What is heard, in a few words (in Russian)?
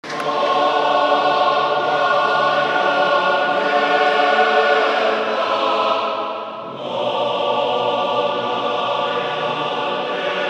Многалета, male choir; male choir of mephi